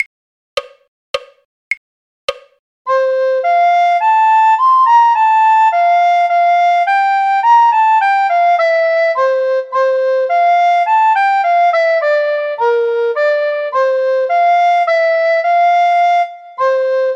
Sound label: recorder; the ash grove; folk